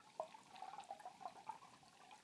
Waterway D Light

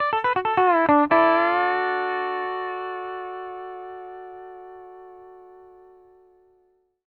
Country, ENDING 140

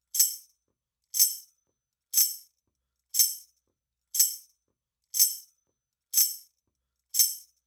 Tambourine, 120bpm; Zero-G; Nu Disco; Nicengroovey C

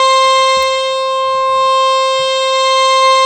HARMONIUM, World Instruments

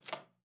KLPB E3, ALTOSAX; Xsample VOL-2

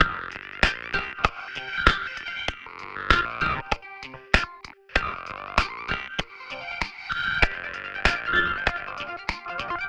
LOFI, funk; USB Soundscan vol.47; AKAI

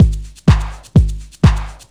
steady on, Deep House 125bpm

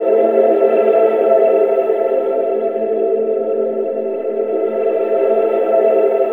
CHAKR CHOIR; East West - Twisted Textures-BT CD3

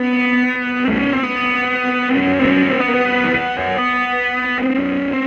Sing Dip, Electric Guitar; Sonic Foundry (Sony Creative Software); Friendly Fire; Serpent

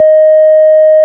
ORG, Farfisa; Roland LCDP09 Keys of the 60s and 70s 1; Farflt DBars

